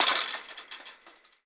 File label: fence; firefight